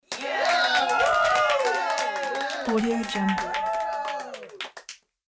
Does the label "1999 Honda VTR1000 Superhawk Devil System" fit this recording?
No